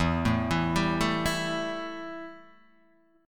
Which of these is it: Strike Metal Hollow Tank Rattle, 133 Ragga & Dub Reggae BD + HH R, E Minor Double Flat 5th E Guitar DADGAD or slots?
E Minor Double Flat 5th E Guitar DADGAD